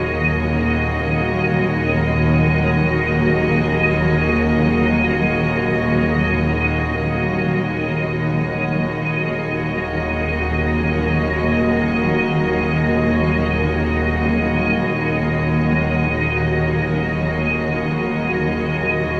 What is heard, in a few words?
100 Em7 Ambiance, Ambient String Loops; Luxe; Diffraction